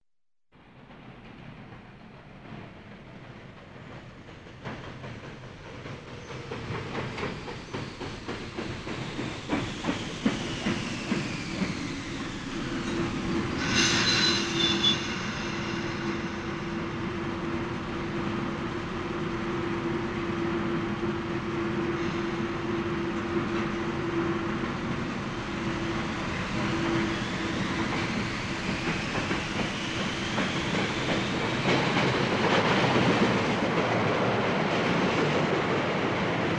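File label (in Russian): звук электрички подъезд остановка отправление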